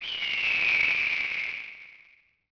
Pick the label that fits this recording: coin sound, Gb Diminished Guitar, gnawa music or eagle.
eagle